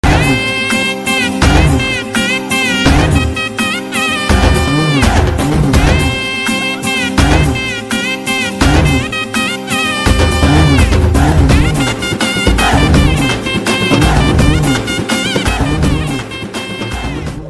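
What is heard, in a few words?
background music tamil; varuthapadatha valibar sangam; sivakarthikeyan movies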